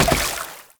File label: Step Water, Foley; Warframe; Oraxia